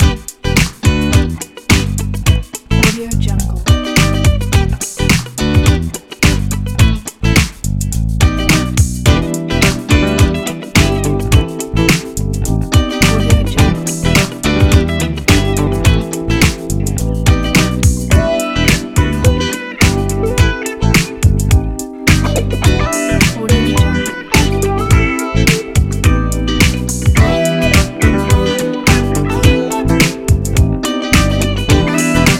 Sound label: funk fact